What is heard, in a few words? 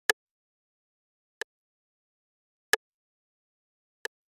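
rimshot; Yocto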